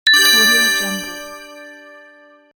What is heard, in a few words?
win points